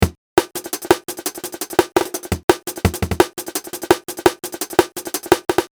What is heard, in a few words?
drums